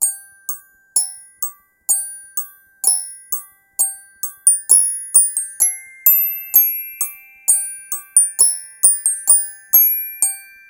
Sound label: Chime; Nancy Drew Secret of the Scarlet Hand